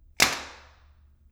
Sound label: table foley; DoomRTX